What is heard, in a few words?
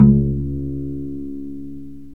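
strings violoncello pizzicato, Chamber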